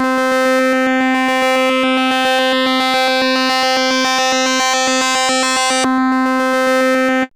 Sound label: sweep FM, drones & pads